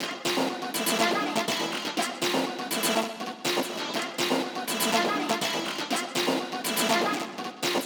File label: 122 E Dry; Kasumi; MELO + FILTER; RoBKTA Sample Pack Supreme